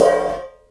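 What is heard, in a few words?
crash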